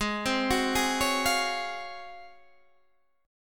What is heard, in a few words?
G sharp 13th, Guitar, Standard; arpeggiated; JGuitar